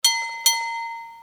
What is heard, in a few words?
bell